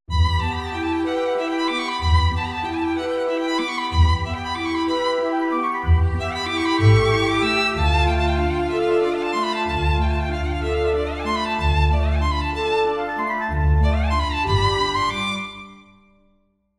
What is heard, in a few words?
Violin; SWAM violin in orchestral context